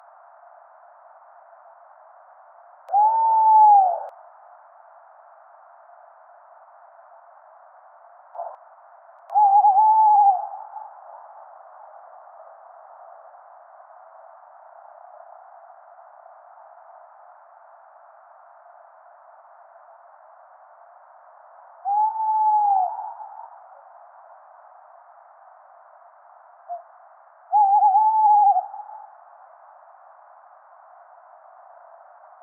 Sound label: call, tawny owl, Strix aluco; The recording was made with an unattended automatic apparatus.